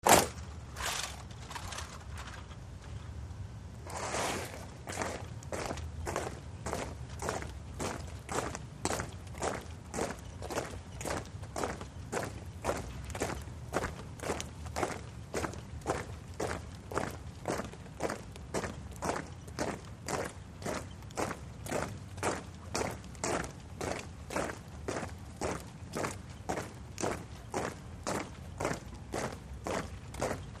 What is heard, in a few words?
Platoon Marching